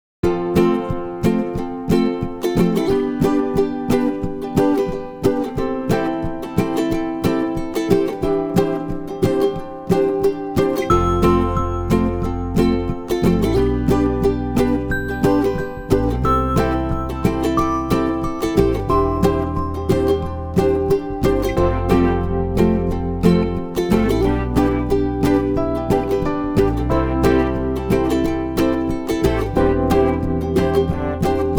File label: Wonderful Surprise No Drums